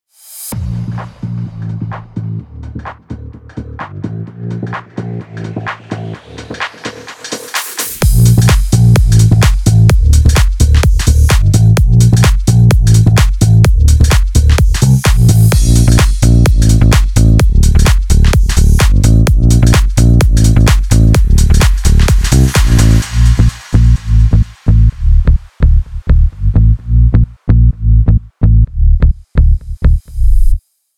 warm pickups; iceberg audio

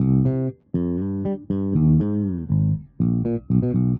bass dry; modular effect board; sonic instruments

